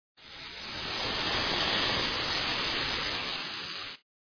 Snake Move; mystic training

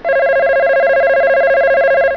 Phone Ring

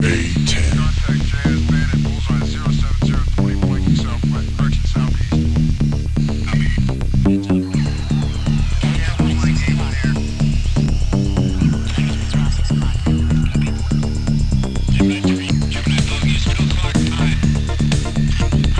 splash music; a-10cuba; PC Gamer 2.14

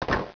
Transfer Finish; Anarchie Pro 3.5J

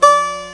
G Note, Guitar; The Guitar Tutor; Educational

